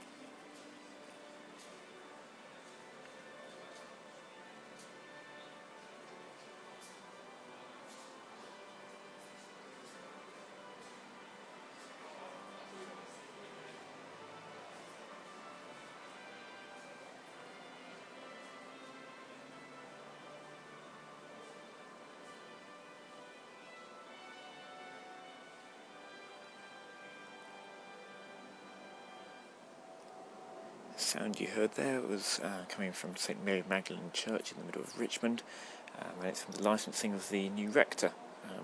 sounds of church service drifting into the night; richmond; uksm

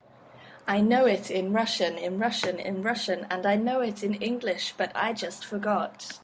in russian chant

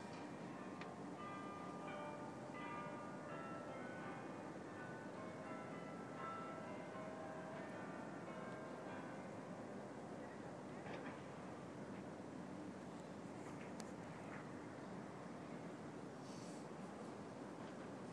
city noise

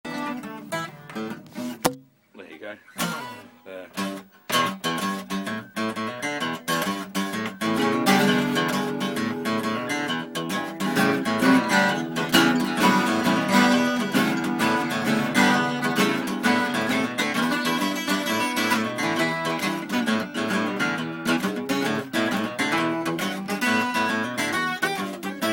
spontaneous jam; vo session

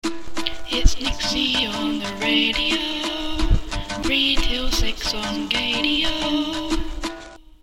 jingle

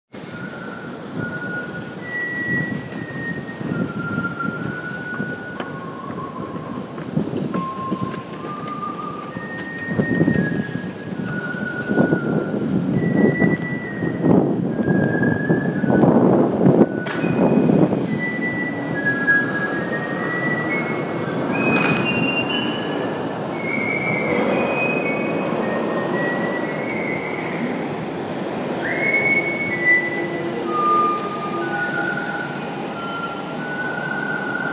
whistling busker at chancery lane tube

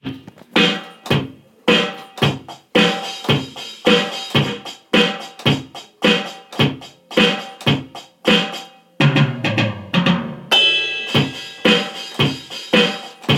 4/4 drums

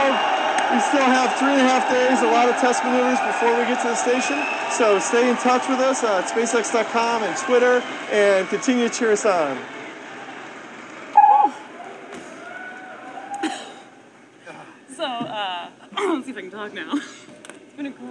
and there was much rejoicing; dragonlaunch; nasasocial; nasatweetup

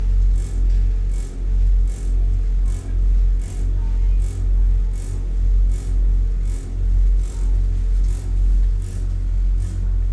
railway unpleasant rumbling from roof of carriage; started around lewisham

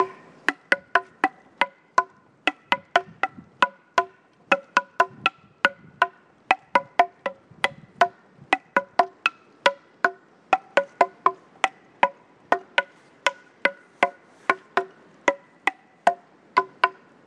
wooden xylophone

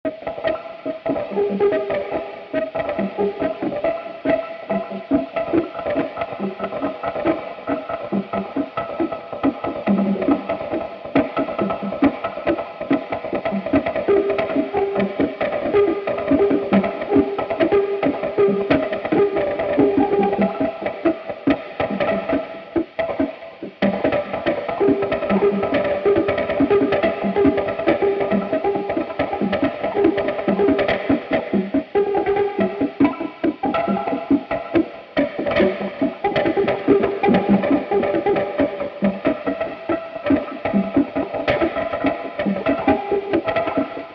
bad bongo

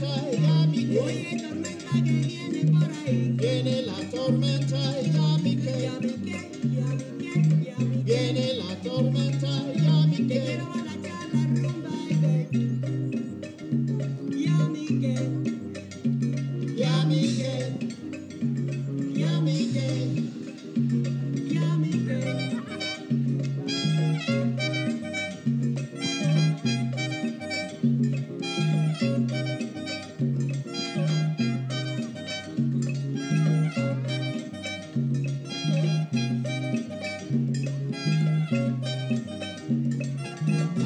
saltaire roberts park bandstand music

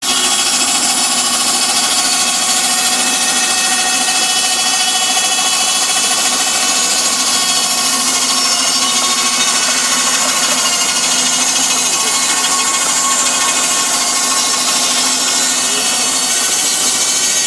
traction engine powered timber saw; marshall sons co

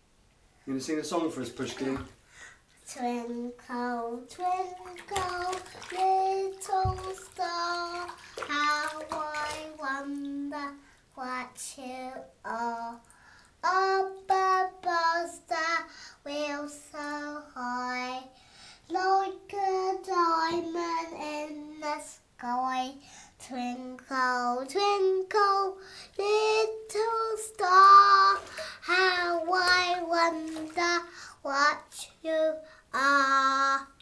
sings twinkle twinkle little star

